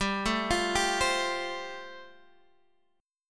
G 7th Suspended 2nd Suspended 4th, Guitar Half Step Down